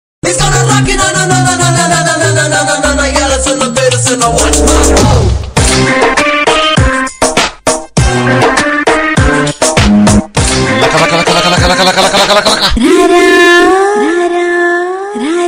tamil mashup ringtone tamil bgm song tamil remix bgm song